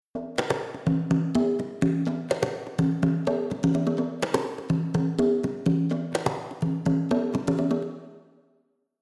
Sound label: Reverb Size Small